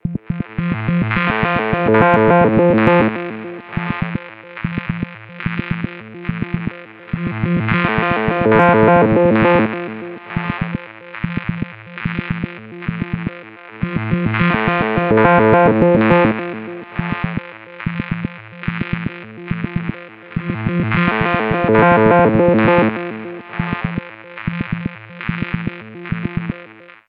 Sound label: munge, alarm